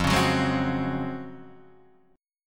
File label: Db Major 11th F